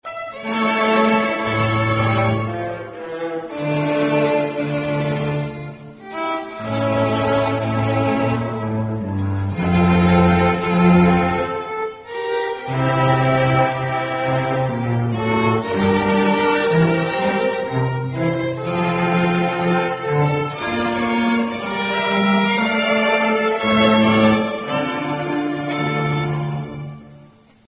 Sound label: strings